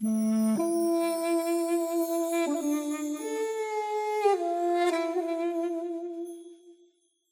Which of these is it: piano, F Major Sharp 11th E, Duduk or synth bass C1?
Duduk